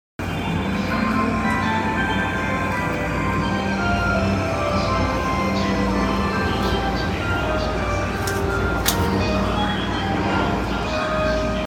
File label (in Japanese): 宇都宮線東京南, train sounds